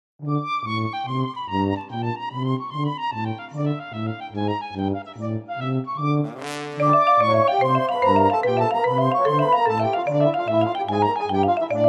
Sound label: Frog March